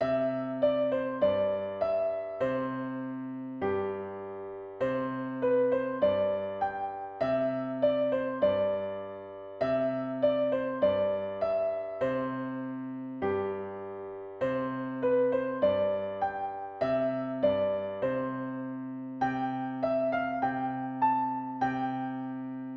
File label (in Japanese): ピアノ